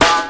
good snare single hit g sharp key, Royality free snare drum sound tuned to the G# note